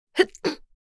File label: Woman quiet sneeze